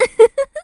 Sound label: giggle